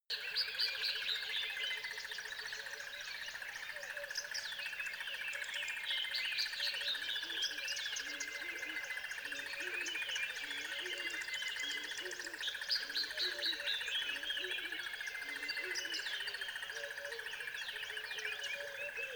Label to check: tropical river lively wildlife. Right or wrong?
Right